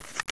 m4a1 silencer screw

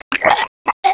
drown

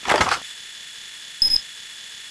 laser minigun draw

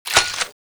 clip out; weapons; coilmg

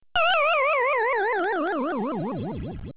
game over pacman

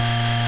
laser minigun charge loop

